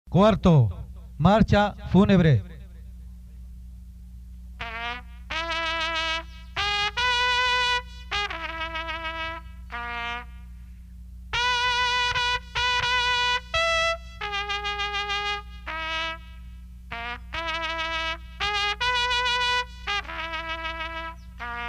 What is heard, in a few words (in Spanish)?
marcha funebre, toques de trompeta de caballeria; himnos de caballeria